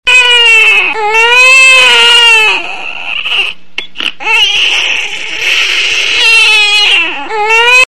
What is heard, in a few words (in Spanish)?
gemido bebe